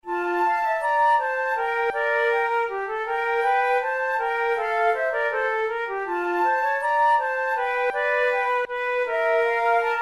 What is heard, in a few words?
methode de flute; tulou